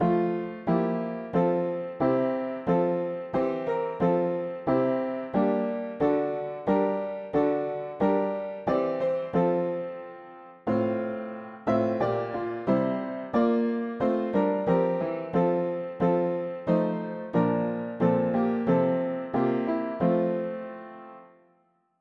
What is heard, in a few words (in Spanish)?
mono piano; hymns; Himnario pequeno